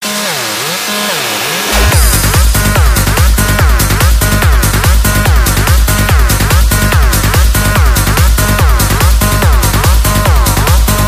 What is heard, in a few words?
PsyTrance